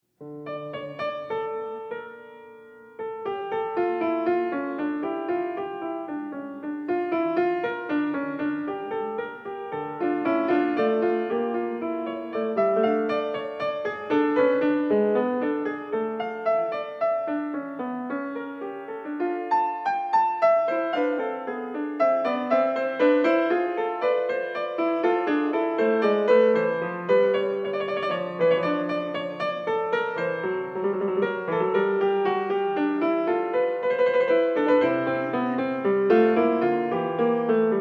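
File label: Prelude in d, piano; BWV-899; Bach; Prelude & Fugue in d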